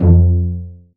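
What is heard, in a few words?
strings F1, String Pizz, AKAI S-1000; Retro Sampler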